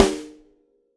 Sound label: Snare Stick Hit Rim Dry Session, drums